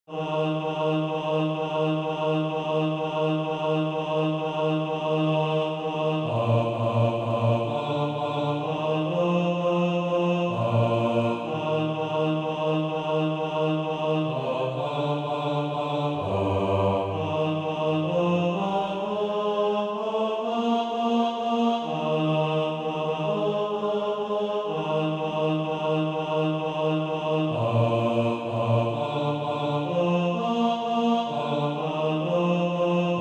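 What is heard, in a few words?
tenor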